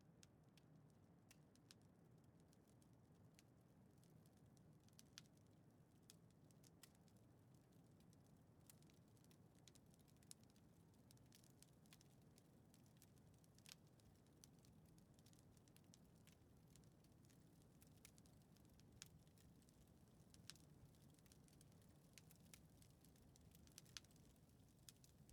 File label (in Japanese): 猫見の館でマッサージ; ねこぐらし。3～コラット猫娘のテレパシー～